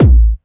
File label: BD Punchy; Vengeance Samples; Bassdrums